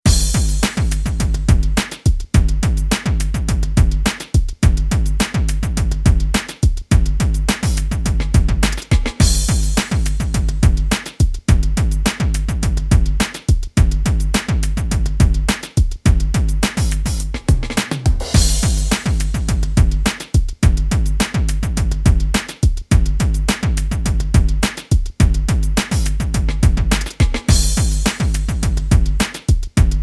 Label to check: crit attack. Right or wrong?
Wrong